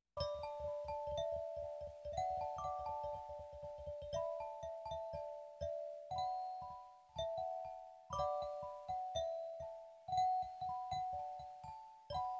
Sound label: D in 4 bells